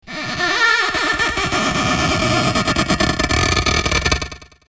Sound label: creaking door